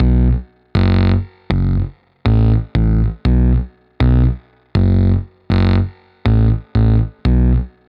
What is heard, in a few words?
Brass Bass; Sonic Foundry (Sony Creative Software); The Electronic Music Manuscript Collection; Bass Foundations